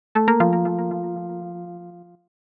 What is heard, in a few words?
message Tone